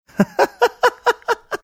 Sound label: laughing